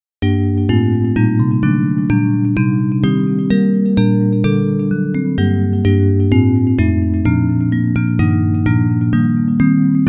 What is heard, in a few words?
By Gracious Powers Bells; Hymns; Praise